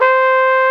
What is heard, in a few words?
FLUGEL C5, WIND; AKAI S-Series CD-ROM Sound Library VOL-2